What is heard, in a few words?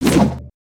Staff Spinjitzu; weapons; aronwk collection; ndaudio